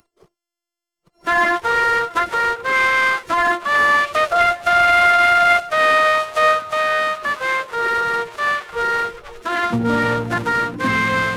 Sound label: Classic Pop; laputa